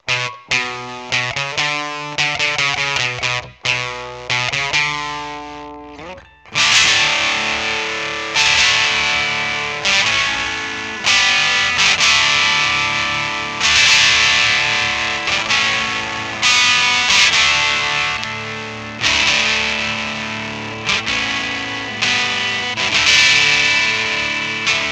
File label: maestro fuzz tone fz 1b